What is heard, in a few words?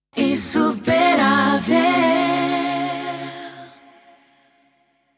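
Sung Jingles, 120bpm C minor, Acapella; Radio Insuperavel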